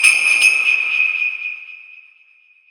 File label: punch target layer; tusk